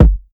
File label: natural kick single shot g key; kick drum samples